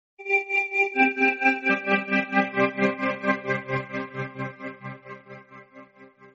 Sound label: msg alert